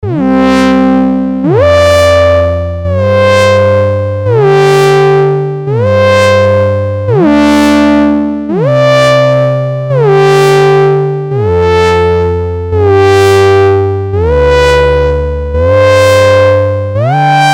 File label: Subsequent 37